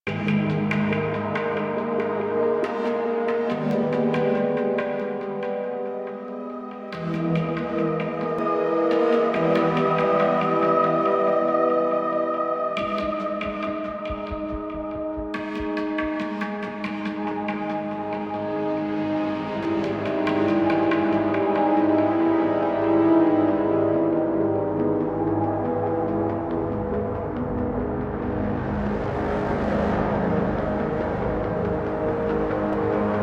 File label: Merchant Customization Loop, Music; Warframe; DE; Hubs; Veilbreaker